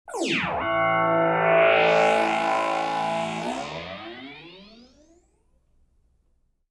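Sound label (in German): aufbauendes energiefeld; Geraeusche; Science Fiction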